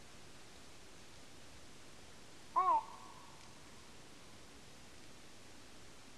Great Bittern single call, nocturnal flight call; Lingshan; Beijing; nocturnal flight calls (NFCs)

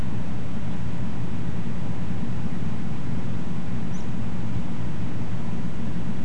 Poss Meadow Pipit 5.5-7.2kHz; nocturnal flight call project in beijing autumn 2021

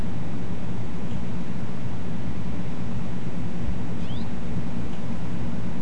Common Rosefinch call; nocturnal flight call project in beijing autumn 2021

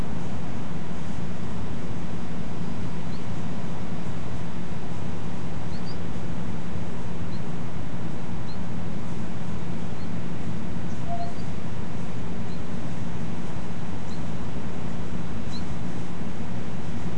Forest Wagtail call series short sharp 4-4.5kHz; nocturnal flight call; Beijing

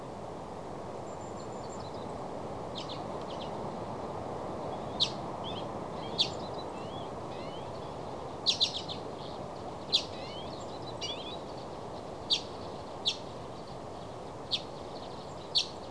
Common Redpoll series, nocturnal flight call; Lingshan; Beijing

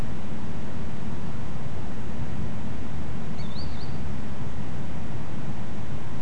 Green Sandpiper, nocturnal flight call; Beijing; nocturnal flight calls (NFCs)

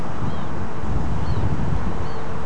Scaly breasted Munia

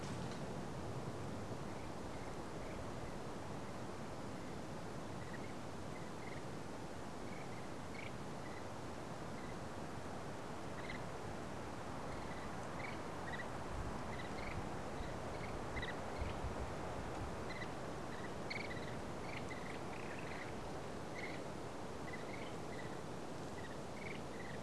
prob Siberian Sand Plover, nocturnal flight call; Lingshan; Beijing

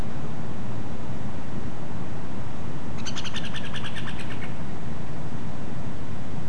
mystery, nocturnal flight call; nocturnal flight call project in beijing autumn 2021

